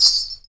METAL, Afro Shakers Ankle Pods

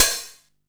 Hat, Drum & Bass

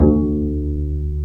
STR Cb Pizzicato; Roland L-CD702; Cb Pizz 3

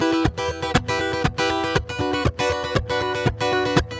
GUITAR SALSA

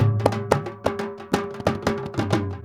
INDIA L, IND ENS; Spectrasonics - Heart of Asia CD2

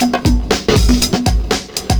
PERCS, 70 s Breakbeats

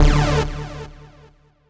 Synth Hit D; Trance; Synth Hits; Zero-G Trance Inducer; 90 s Sample CDs

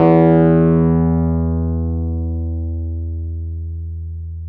PNO, Wurly

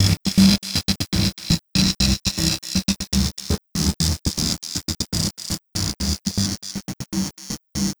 Envelope Beat; Drums & Drones

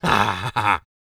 LAUGH, MALE HUMAN; R&B & Dance; ILIO; Vocal Planet

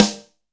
AMB SN; AKAI S-Series CD-ROM Sound Library VOL-1

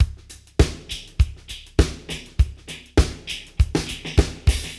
disco fx 100, Beat Loops; Zero-G; Monster Beats

